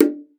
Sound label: FINGA, BONGOS CONGA; Best Service ProSamples vol.42 - Session Instruments